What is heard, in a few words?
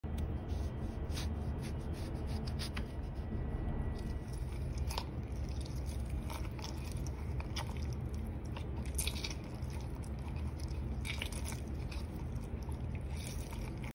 Lemon squeeze; چلاندن لیمو